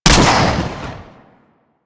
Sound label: Swarm Missile Launcher Fire Shot; Warframe